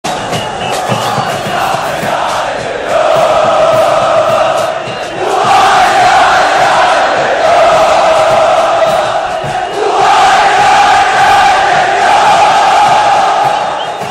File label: awesome soccer chant